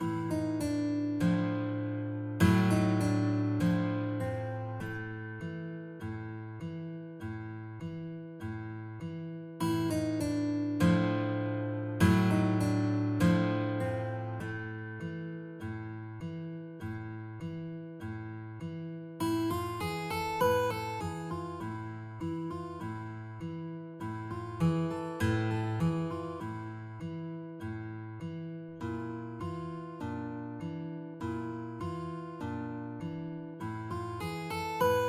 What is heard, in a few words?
guitar, guitar duet